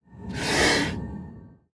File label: guardian main, monster; Bastet